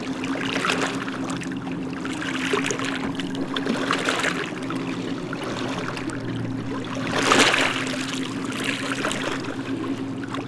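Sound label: water loop; portland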